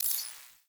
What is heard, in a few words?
UI Weapon Zoom Out; Warframe; Railjack; Player Ship